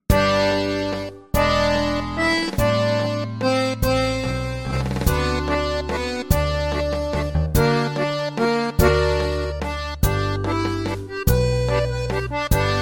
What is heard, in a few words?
Melodeon Diatonic Button Accordion; Akkordica